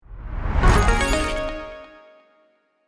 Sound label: Corporate ident effect intro podcast; logo animation